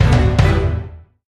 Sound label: small Win; netent; piratefromtheeast